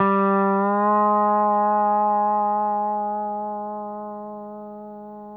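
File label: pedal steel bend up G3, Left; PEDSTL BENDS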